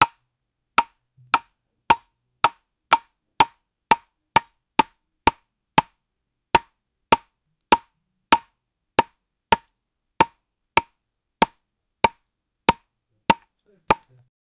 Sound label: casual sound of chopping, axe